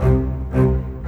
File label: Rock Pop Bass Cello, Orchestral